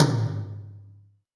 Tom Md Flange, Alesis D4

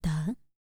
TABLA BOLS, E.INDIAN; ILIO; Vocal Planet

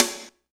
Snare DIGI, Snares; Roland L-CDX-01; Snares 3; Sn Modules 3

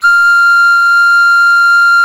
pan E 6, panflute; SoundBytes - Early Patches

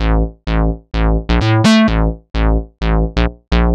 128 Synbass, 128bpm; Zero-G; Soundclash; Combi Loops; Party Pumps; Nu Dance Electro

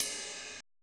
ride, Roland MC-909

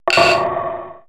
PERC MIX, Retro Sampler

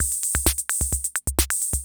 CR78

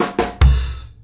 KICK SNAR, Live Snares; PlatinumPhattCD1; E-MU Producer Series Vol. 8 – Platinum Phatt

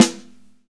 Snare Live, Drum and Bass

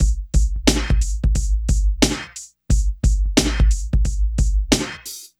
DRM, Hip Hop and R&B Drumloops

